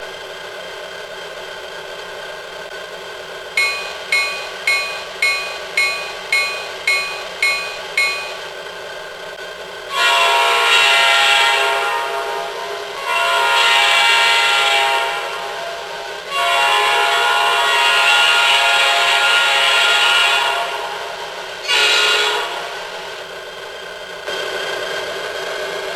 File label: Diesel Bell Whistle Speed Up Reverse Stop, train